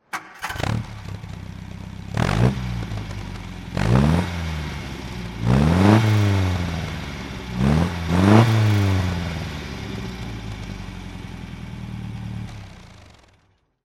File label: mg mga 1500 throttle; MG MGA 1500 roadster, 1955; Engine sound; V3D/ ClassicarImages; Marc Vorgers